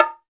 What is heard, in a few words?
PRC BONGO H